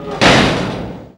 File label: AMBIENCE INT; AMG - Vince Clarke Lucky Bastard